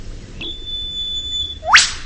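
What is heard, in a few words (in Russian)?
резкий звук который издает птица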